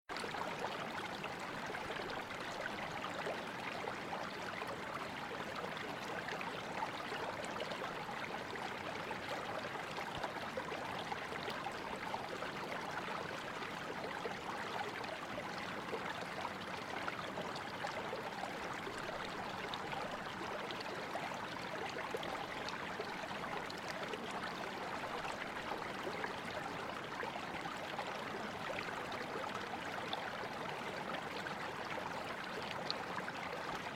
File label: creek